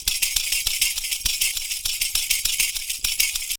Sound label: PERCU; Univers Sons; Raricussions